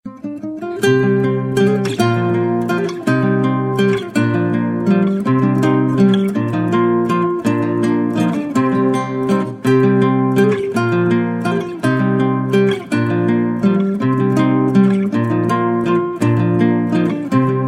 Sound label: Love Instrumental